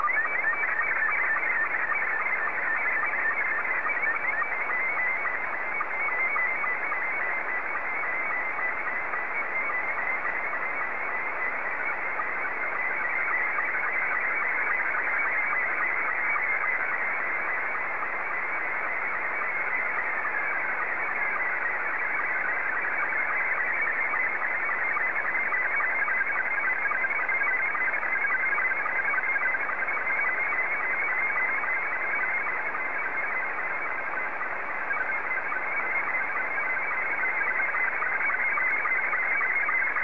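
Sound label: SSTV, Scottie 2; JA3QJQ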